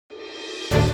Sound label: Battle; ALTDEUS Beyond Chronos; Android